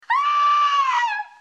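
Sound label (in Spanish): grito mujer, woman scream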